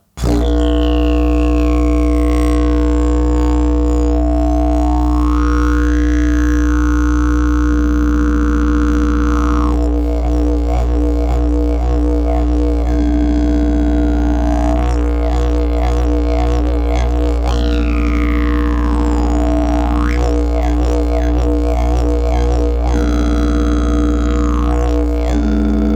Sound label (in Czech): javor, didgeridoo